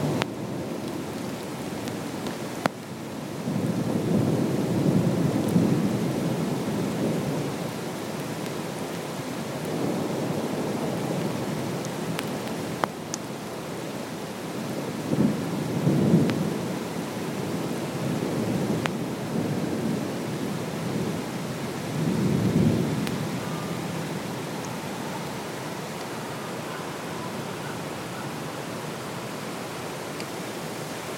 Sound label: midjune thunder and rain nyc